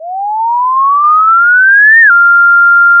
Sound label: Sound of a police car siren